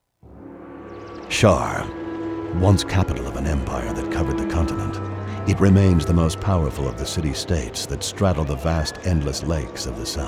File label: Cinematic; The Shadow Sun